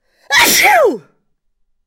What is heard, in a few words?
sneeze dramatic